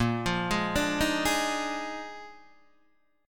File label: Bb 11th, Guitar, Open D